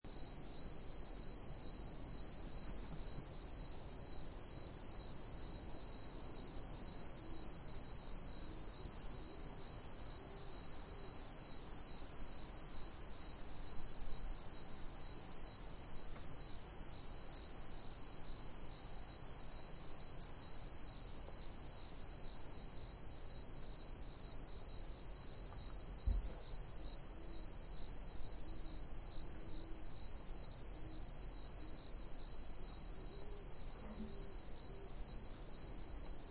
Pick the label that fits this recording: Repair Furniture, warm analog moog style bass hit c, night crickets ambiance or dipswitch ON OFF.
night crickets ambiance